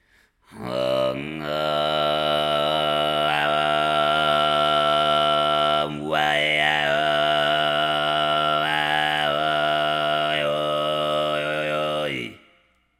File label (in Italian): Audioesempi khovu kargyraa